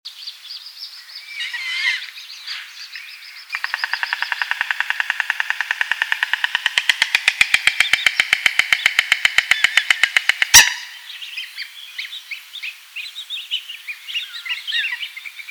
White Stork Sound

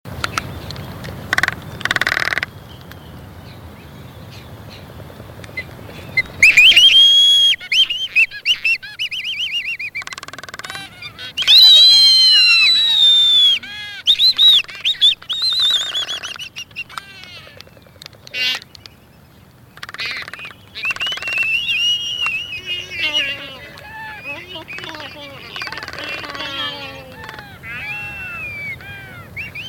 Albatross Sound

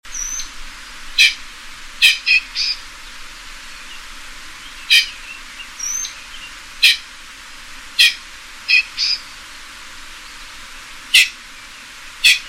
Pheasant Sound

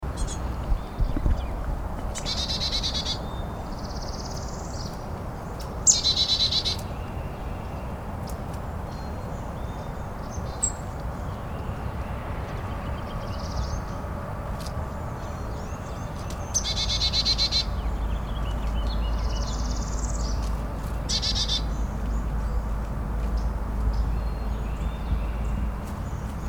Chickadee Sound

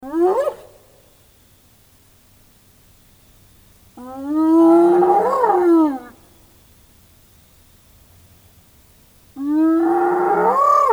Hyena Sound

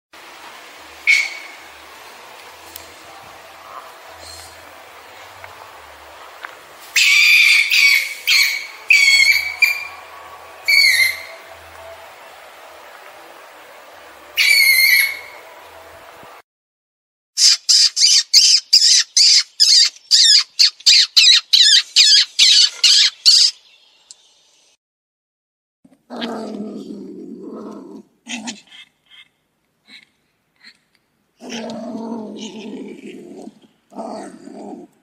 Marten Sound

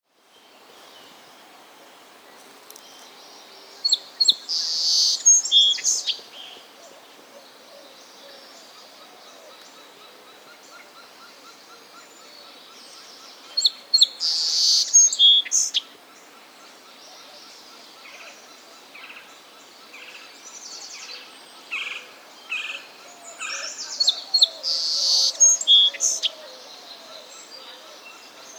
Song Sparrow Sound

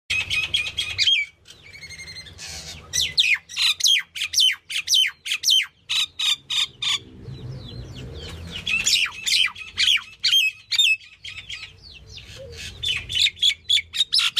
Myna

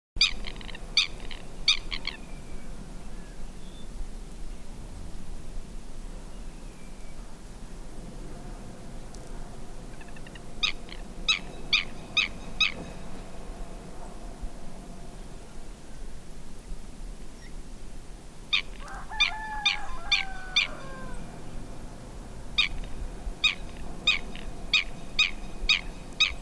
Lapwing Sound

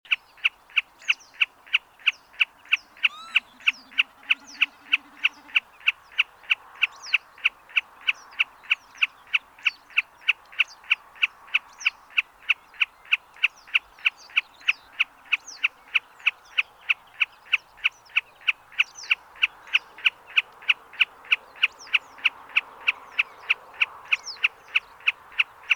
Snipe Sound

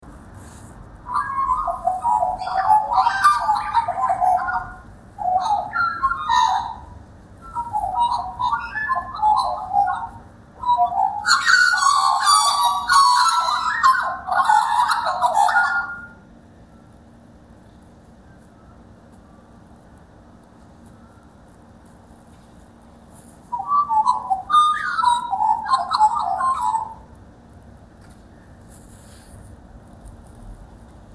Magpie sound

